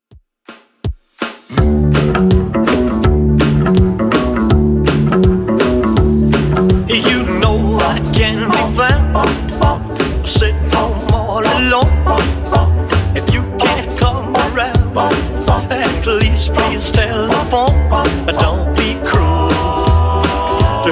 70s Redrum 164bpm

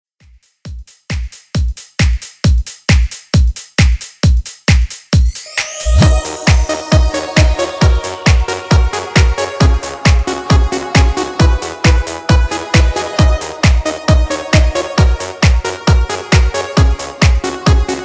2000s Dance; Redrum

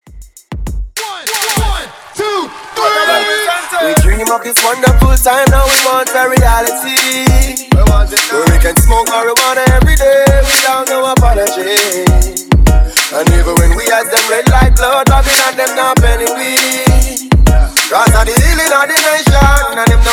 Reggae 90s Break In Dirty ReDrum 100 BPM

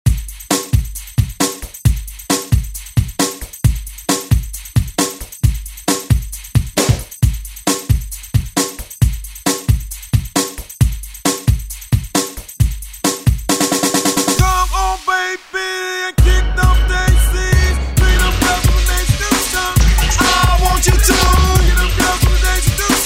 90s ReDrum; DJ Mhark

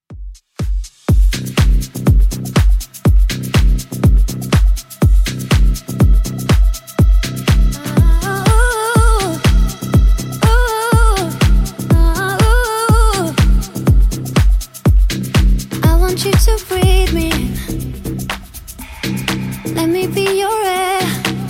2ks Redrum 122 bpm

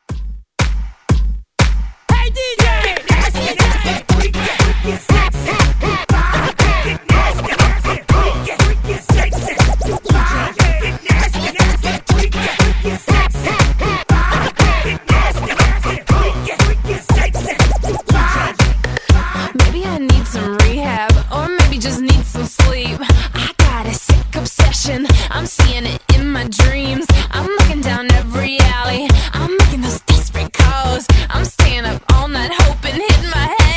Hype Scratch Re Drum 120 Bpm

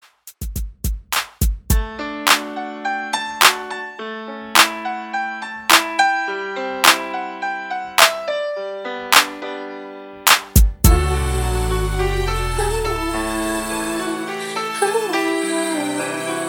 Throwback 90s ReDrum Chorus 105 BPM

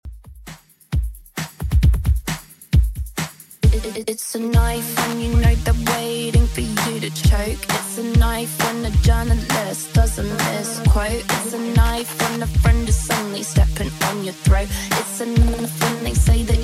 Top40 Redrum 120bpm

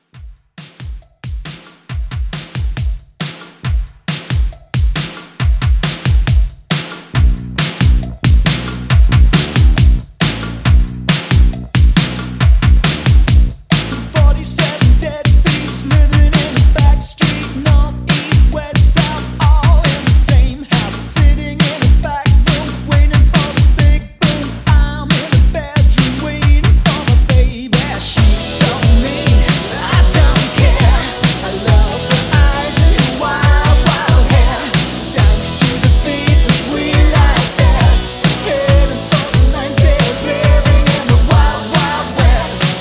80s Pop Mixshow 137

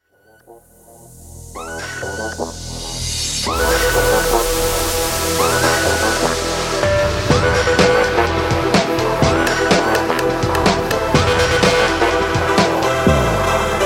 Festival 125Bpm

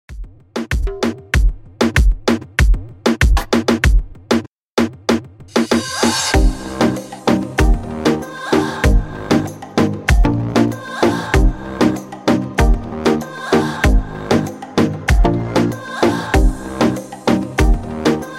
Reggaeton 96Bpm

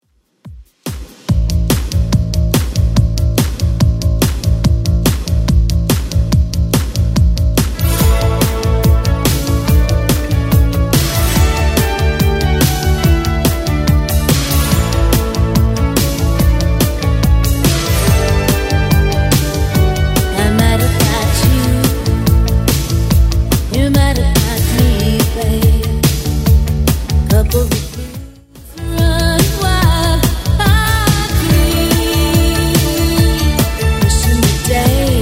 80s Redrum 143 bpm

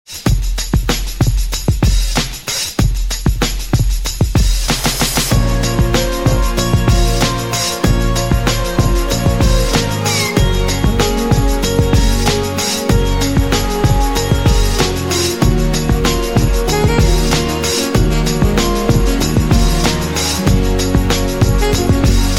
Classic Hits Slowjam

